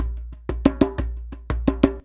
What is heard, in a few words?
119 DJEMBE, Modern Percussion Loops